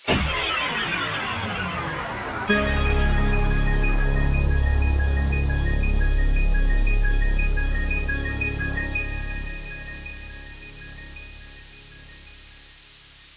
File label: Music; 特酷声音素材库; 片头音乐